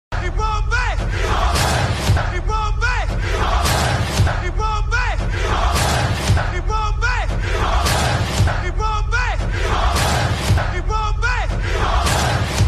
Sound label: War Chant; Wakanda